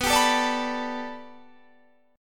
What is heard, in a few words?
B-Minor 7th Sharp 5th B down; strummed